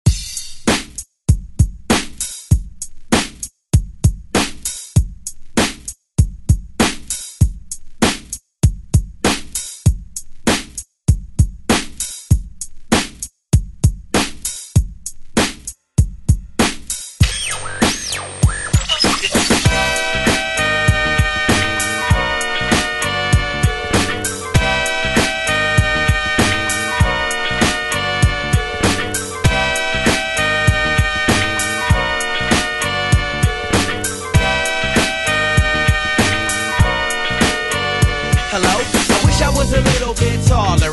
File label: 90s ReDrum; DJ Mhark